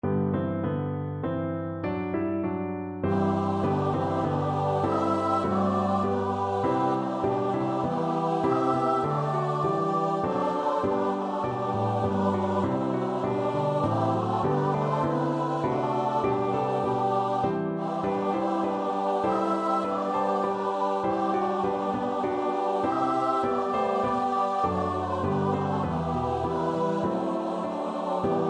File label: holly ivy sharp choir